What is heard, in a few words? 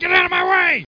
yell